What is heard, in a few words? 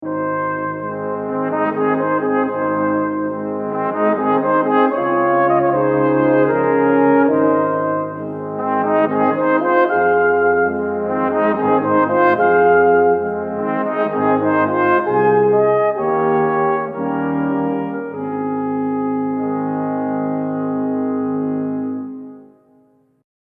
Waltz ending; Brass